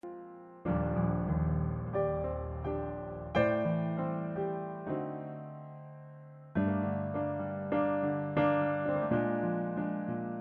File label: groove; key - Gm to Abm to Am to Bbm - vocal range - G to Db; Really cool GROOVE arrangement of this classic song.